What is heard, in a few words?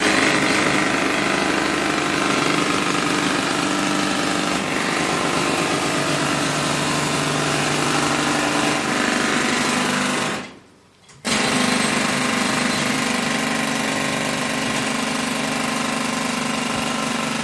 Jackhammer Close, construction large